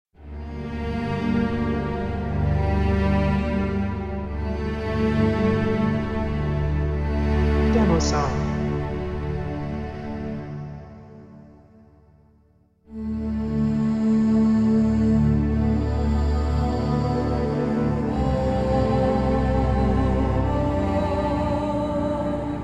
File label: Emotional Moments Alto Singer